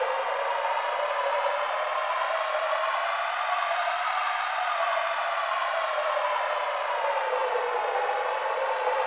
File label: Sandstorm; Zelda; OOT